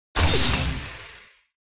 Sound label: Laser Sword Turn On